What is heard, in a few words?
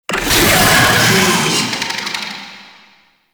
Summon Vocal Verb; Warframe; Uriel; Catenach